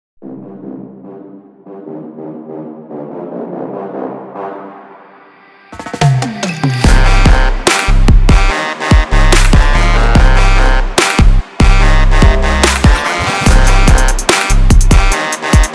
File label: trap beat